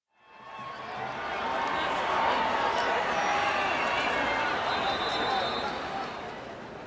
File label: crowd huge intro